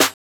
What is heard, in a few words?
Snare, jungle breaks drum sounds